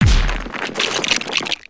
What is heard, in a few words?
Smashable Stone; destructibles